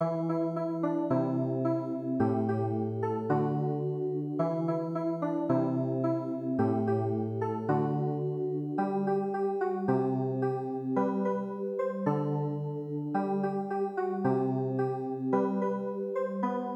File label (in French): CLAVIER